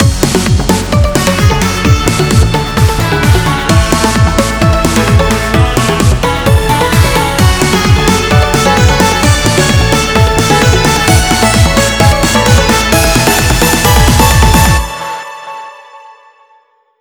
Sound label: Sunset beach